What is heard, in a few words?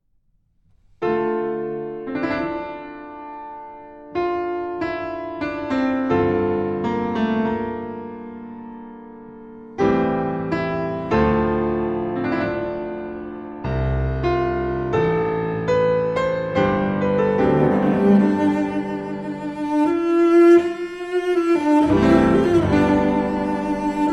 Sonata For Cello and Piano; Debussy